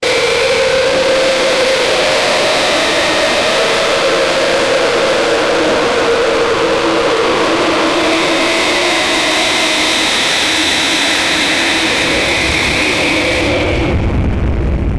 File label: horrifying noise for horr; film